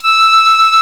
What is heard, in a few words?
fife, British fife; Roland L-CDX-03 Disk 1